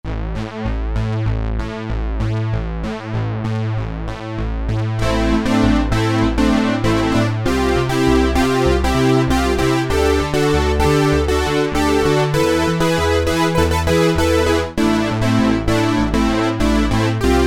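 Chorus Synth, Memorymoog Classic Analog Polyphonic Synthesizer; 56 Chorus Synth; Factory programs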